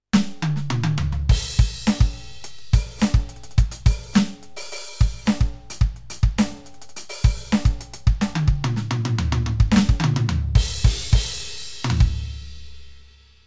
Roland TD07 Live Acoustic

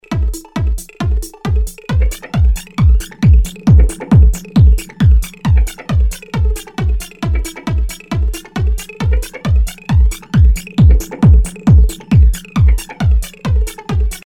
Flanger

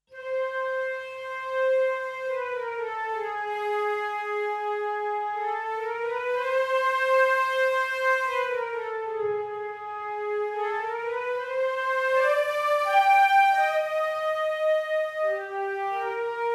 woodwind flutes ensemble

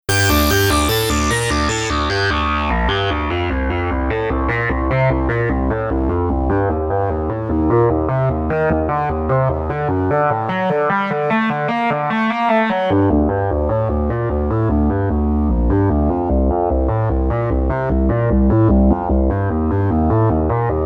iMini FM Crystals

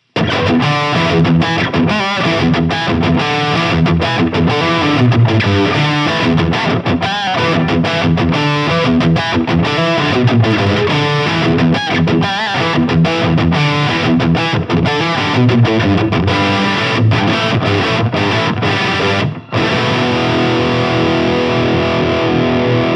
Engl Savage 120 MkII Crunch

